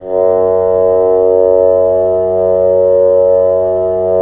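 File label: wind bassoon; LA Composer vol. 3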